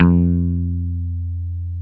BASS, F 3

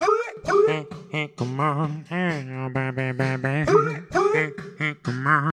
VOX, Roots Disco; USB Soundscan vol.32; AKAI

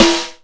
Snare, Roland JX-305

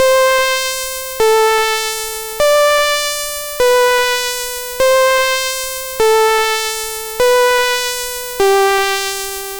C64 Synth Phrase; 8-Bit; Chiptune; Commodore 64

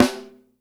HIT, SNARES; SW SNARES; Sampleheads; New York City Drumworks VOL-1

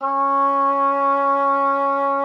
C#4, OB D.AMORE; Xsample VOL-7

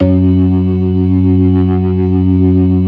ORGAN, 4 DRAWBARS; Keith Emerson Signature Series

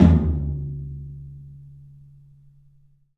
TOM, Low Toms, 3D Percussives; E-MU Producer Series Vol. 5 – 3-D Audio Collection

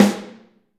SN, Drums Percussion; CMB Kit 1

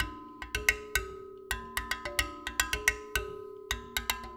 APH M BIRA-R, ETHNIC INST; Spectrasonics; Liquid Grooves 1 & 2

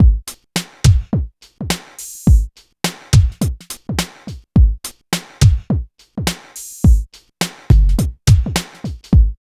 LOOP, Phat & Phunky; East West - Phat & Phunky CD2; 90's Sample CDs

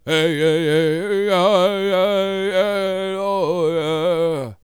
World Voices, CHOCTAW

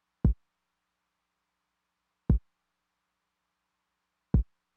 kick drum w accent, Roland TR-909; drum machine; Roland TR-909 Ableton Project

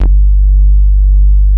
MOOG BASS; East West - Phat & Phunky CD2; 90's Sample CDs

